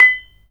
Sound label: B6, GAMELAN SET; Spectrasonics; Heart of Asia